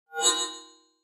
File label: Mouse Over HF Elect, mouse over